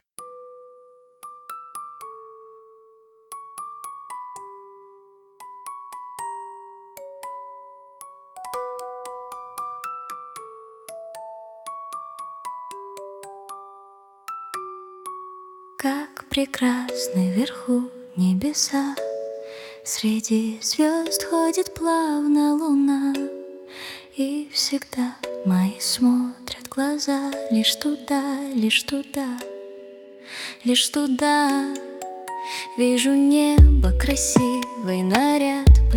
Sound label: Glockenspiel Cover; Как прекрасны вверху небеса